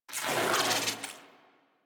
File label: shock rifle tail; Warframe; Weapons; Corpus